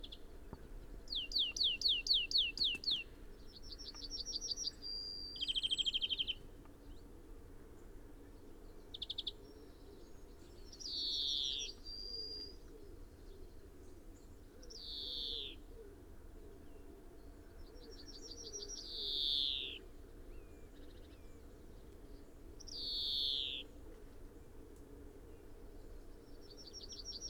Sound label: Chloris chloris; SETE